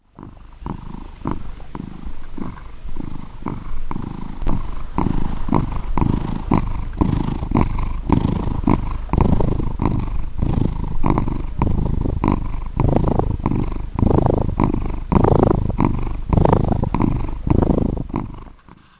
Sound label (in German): max schnurrt